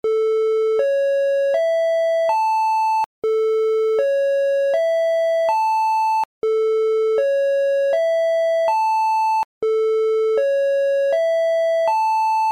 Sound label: Sine All